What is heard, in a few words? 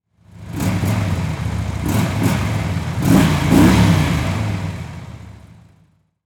Auto Motorcycle Rev Multiple; Transportation